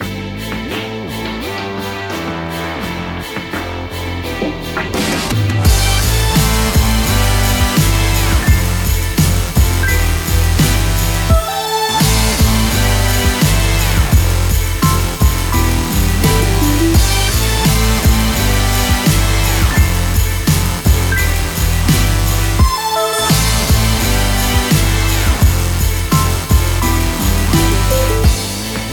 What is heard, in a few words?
contest hip hop